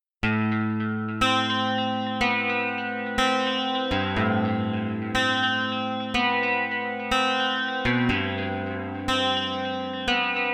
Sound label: Acoustic